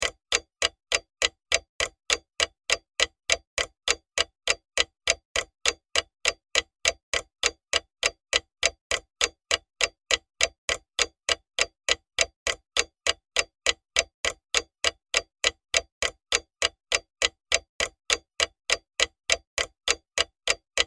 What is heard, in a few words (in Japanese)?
時計が秒針を刻む音